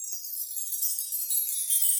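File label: Chimes A Finger down, Latin Percussion; Drum Hits; Bell; Ableton; Factory Packs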